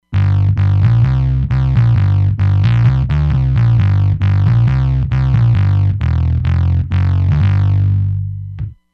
Fuzz Mute

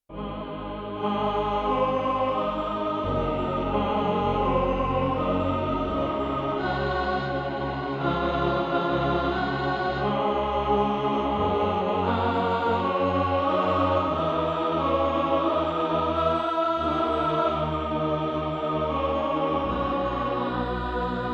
OCTAVES, Choir

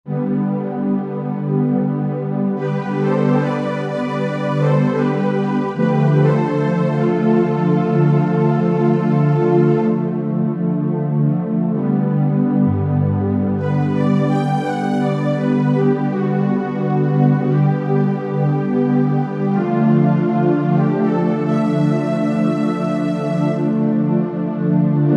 Pop Strings, Korg TR-Rack

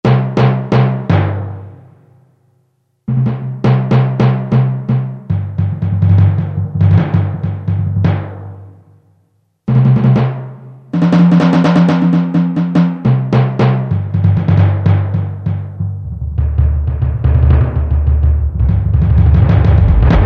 TIMPANI; Roland SN-U110-06

